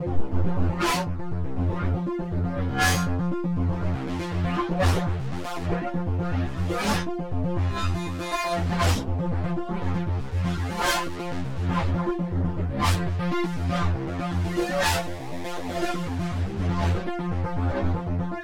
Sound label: Erica Synths Black Hole DSP2 Synth Havok Chorus feedback rate CV